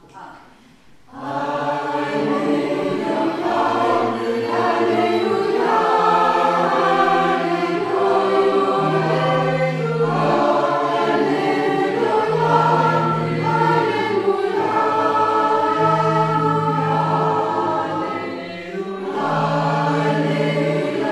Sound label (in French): Alleluia messe de Saint Michel Tutti